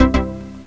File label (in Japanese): Juno-106オンリー。; JunoHit1